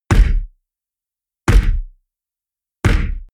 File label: heavy punch; whooshes rises; hits impacts